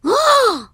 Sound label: gulp; opentdm; bart